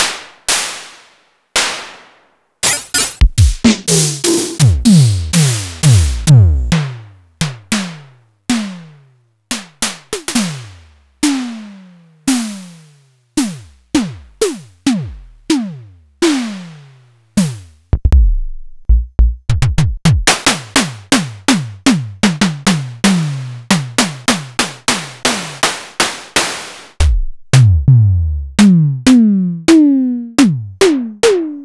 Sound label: Simmons SDS8